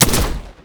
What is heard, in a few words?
pistol